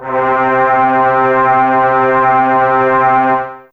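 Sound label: Brass Section 1 C3, Fairlight CMI IIe Brass; Brass2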